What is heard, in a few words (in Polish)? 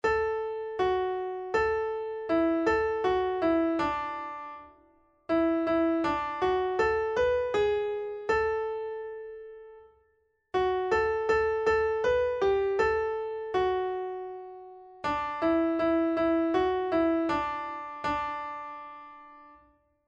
oto Pan Bóg przyjdzie sopran midi